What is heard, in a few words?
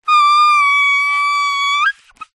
leisure inflated rubber pool toy rub ground squeak